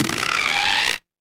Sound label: cartoon stretch